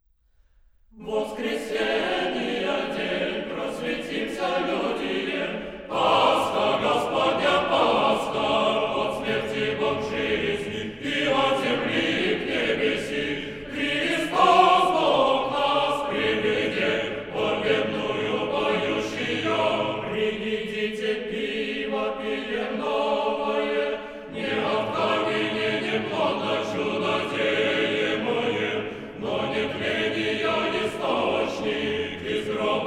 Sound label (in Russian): Хор Валаамского монастыря